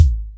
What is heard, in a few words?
Kick, Drum Machines